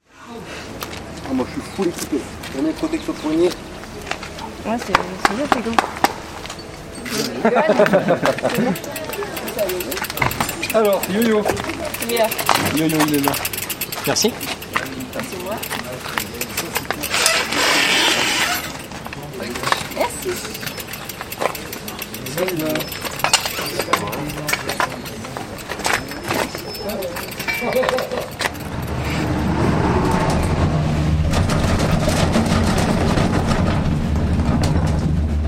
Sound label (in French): son ambiance VTT